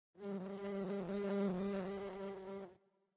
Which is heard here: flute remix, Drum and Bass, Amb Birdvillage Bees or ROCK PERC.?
Amb Birdvillage Bees